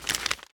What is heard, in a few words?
insert paper